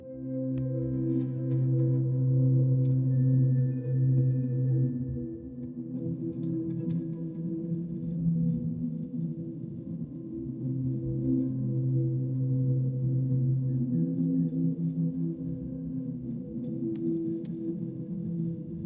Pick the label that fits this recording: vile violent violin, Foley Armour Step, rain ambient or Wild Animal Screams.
rain ambient